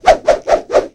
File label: Boomerang whoosh sound